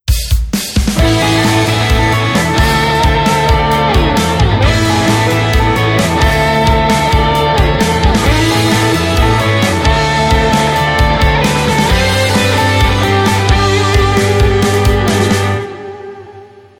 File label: piece of alternative rock